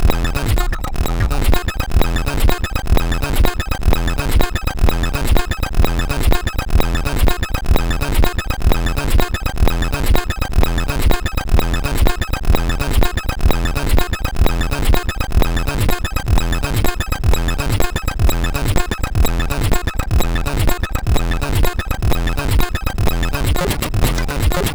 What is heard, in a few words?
Techsound sid CSG8580R5